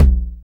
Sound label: Tom Low, TR-909; Giga Samples Collection